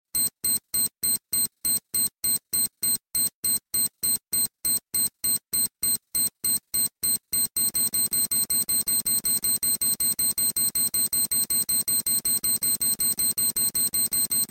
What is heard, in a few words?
Bomb Timer